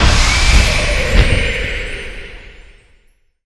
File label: wild Expanding; netent